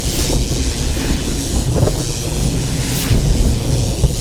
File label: electrical surge attack; LoL; kennen